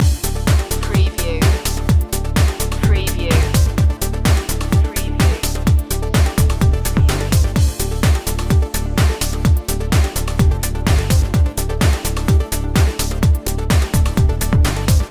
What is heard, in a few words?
synchro express loop